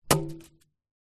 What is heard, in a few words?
tennis racket drop grass; sport; zapsplat